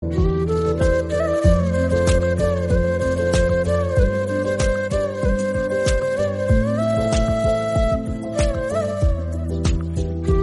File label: bgm tamil; jawan